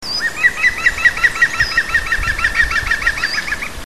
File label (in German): gruenspecht, vogel; hecke; biologie